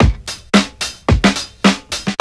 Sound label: FUNK 110, loop; 100-114 BPM